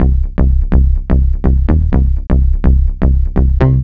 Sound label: bass 125; Best Service ProSamples vol.10; House; AKAI